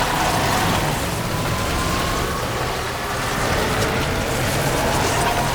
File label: Wrist Gun Alt Charge Riser Loop; Warframe; Tenno; Incarnon